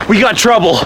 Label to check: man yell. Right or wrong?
Wrong